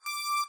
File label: lead